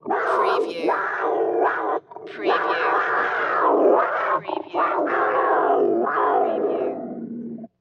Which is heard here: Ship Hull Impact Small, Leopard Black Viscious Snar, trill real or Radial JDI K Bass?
Leopard Black Viscious Snar